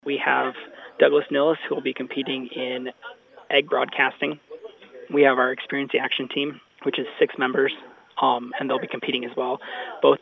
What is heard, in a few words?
Fox Competing